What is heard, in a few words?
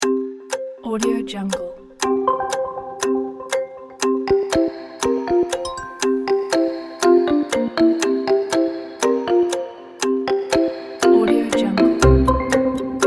quiz time clock